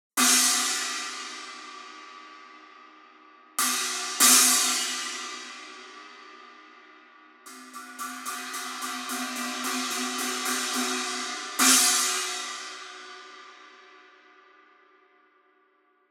Resonant China 18 Rock

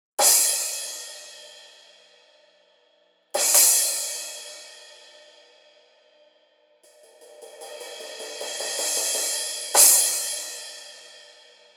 Custom Pointer Crash 15

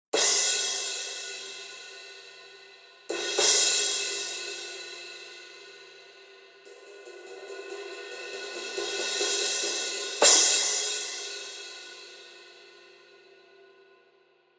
Crash 18 Thin

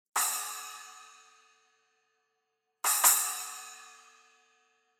Custom Pointer Splash 8

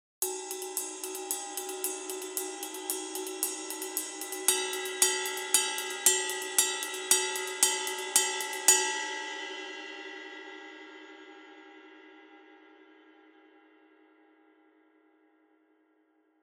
Custom Ride 22 Extra Heavy

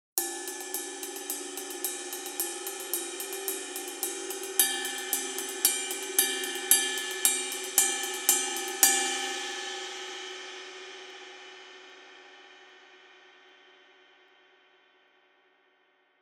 Ride 22; Iris

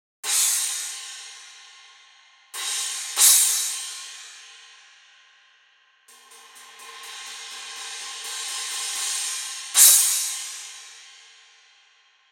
Resonant Crash 15 FX

